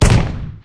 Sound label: Cannon Shot; Captain Ice